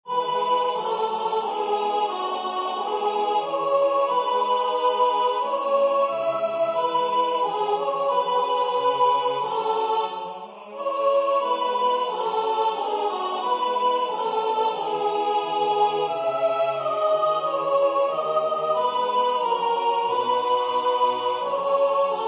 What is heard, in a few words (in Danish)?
sopran; Danmark, nu blunder den lyse nat